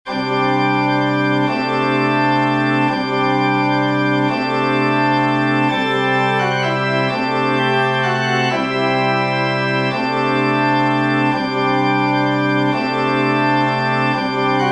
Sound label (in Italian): organo